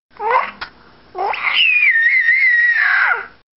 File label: squeal